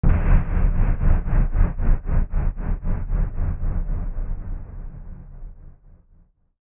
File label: Hit Tremolo Pan Airy, Hit Multiple Tonal Digital Electrical Distortion; Production Element Imaging Element Accent Transition; Blastwave